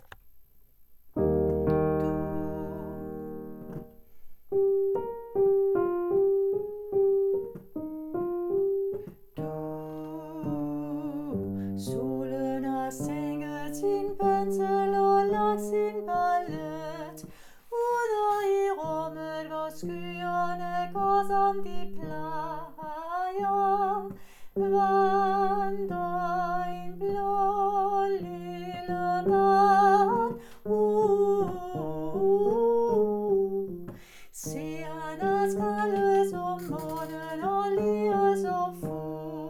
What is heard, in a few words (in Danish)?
BAS med sang